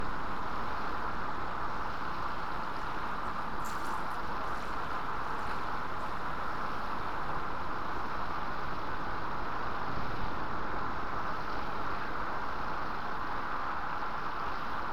Planets Imaginary field recording made on a distant planet distant perspective; watery sound; ShapingWaves